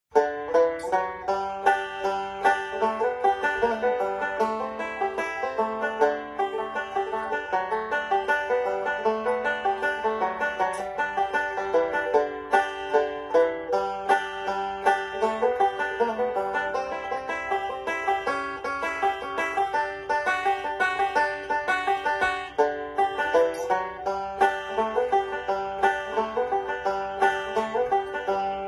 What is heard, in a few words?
Banjo; Zomboid; Lifestyle